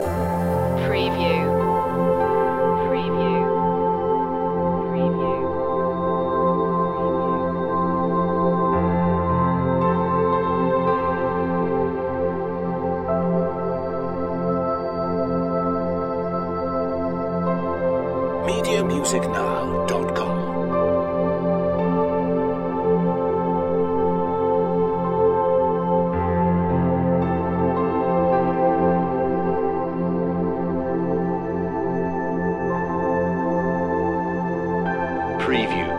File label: mystic piano loop